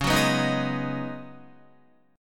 Db 7th Suspended 2nd Suspended 4th Db Guitar; Guitar-4F,4A,5C,5G,5C,6E; Db7sus2sus4 strummed

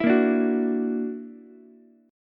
Gb Augmented 7th Gb down Ukulele; Gb+7 strummed

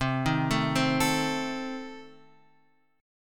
C Minor 6th, chord; Cm6 Chord {8 6 7 5 X 5} arpeggiated

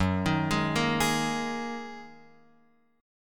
F sharp 6th Add 9th F sharp down Guitar Open F; arpeggiated; JGuitar

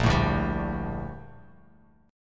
Bb Major 13th Bb Bass Standard; strummed; JGuitar

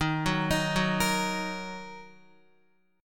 D sharp Minor, Guitar; arpeggiated; JGuitar

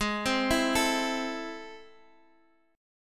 A Minor, G sharp; Am/G#; arpeggiated; chordshape

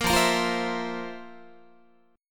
F Major 7th Suspended 4th F, Guitar; strummed

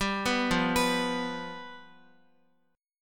F Suspended 2nd Flat 5th, Guitar; arpeggiated; JGuitar

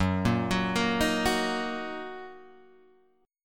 Fsharp 6th, guitar chord; F#6 Chord {2 1 4 3 4 2} arpeggiated

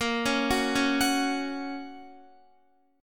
Bb Minor Sharp 5th Bb down Guitar; arpeggiated; JGuitar

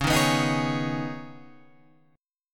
C sharp 7th Suspended 2nd

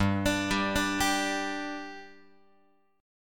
G 5th G, Guitar; G5 arpeggiated

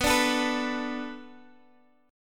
B Minor 7th B, Guitar; strummed; 4E,4A,5D,5G,5C,6F

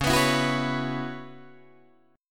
C sharp Minor 11th C sharp, Guitar DADGAD; C#m11 strummed

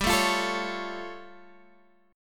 A Augmented 7th G

